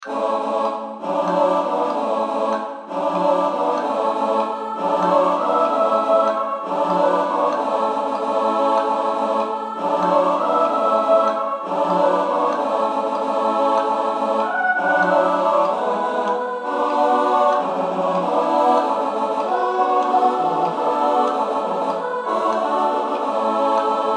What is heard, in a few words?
sans insts